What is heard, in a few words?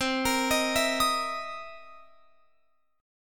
C 7th Sharp 9th C Guitar Open F; C7#9 arpeggiated; x,19,22,22,19,22